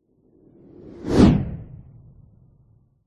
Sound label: science fiction vehicles zoom by ultra sonic whoosh